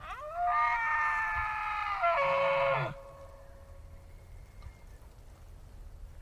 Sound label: Elk Bellowing In Forest